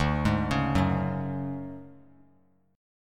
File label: D Major 7th Flat 5th D down 3C 3F 3A 3D, chord; arpeggiated; JGuitar